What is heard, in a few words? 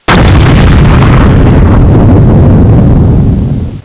Explosion